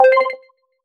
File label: click